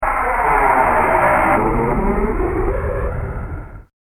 Space Aircraft Fly By; science fiction sound effect; Andrew Fleming

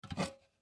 Serving Tray Up, Foley Serving Tray Pick Up; Miscellaneous Bar

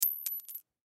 Coin Drop Dime; Coin Drop Dime Cement; Money Sound Effects; Objects; Foley

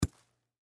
Bullet Shell; Bullet Shell 3030 Drop Sand; Drops (Sound Effects:Objects); Blastwave; Warfare

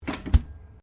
Bin Pedal Metal Close, Chrome pedal bin; Garbage (Sound Effects:Household)

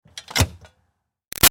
Car Highlander Gas Door Close; 2005 Toyota Highlander V6; Vehicles; Cars; Big Room Sound

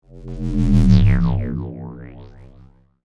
Synth, Stab, Delay, Pass, By; Stabs

